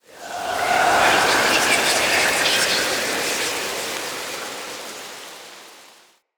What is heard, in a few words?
Ancestor Tree Wind, Ambience; Fey Hub